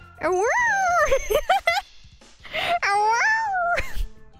Dog

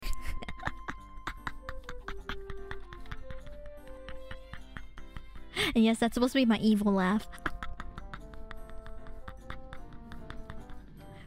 evil Laugh

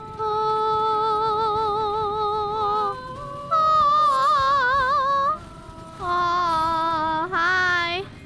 voice; IRySinging

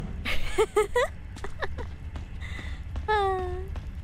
laugh

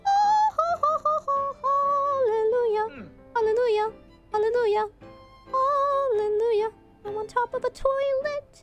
Singing; IRySinging

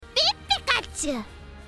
pikachu noises

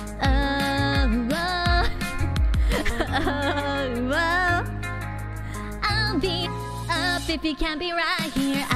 censoring herself mid karaoke

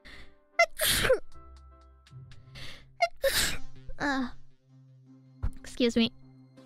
Sneeze

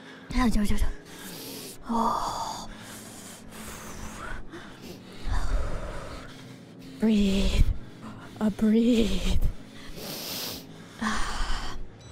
deep breath

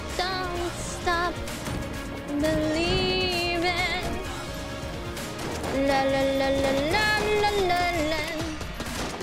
Singing; IRySinging

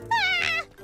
aaarrrggh; IRyScream

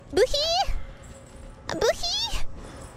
buhiii

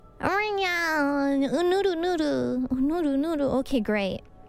hope noises

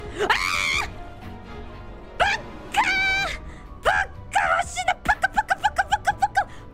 Scream Bakabaka; IRyScream; Only Up 29

